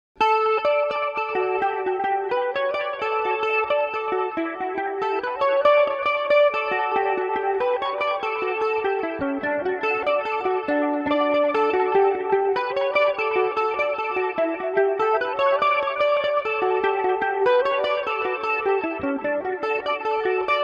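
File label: D major revelation, irish mandolin; Benedict’s Rambles; Revelation Jaguar electric mandolin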